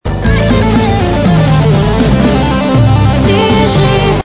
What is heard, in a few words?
Solo